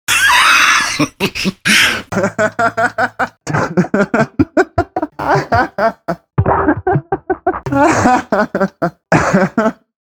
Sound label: laffs